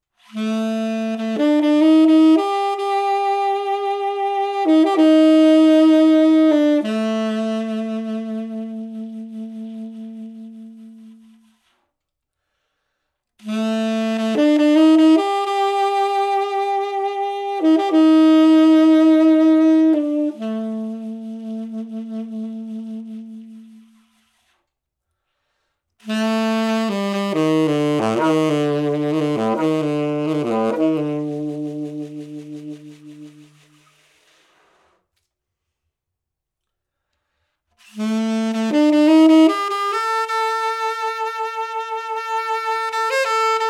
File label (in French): saxophone tenor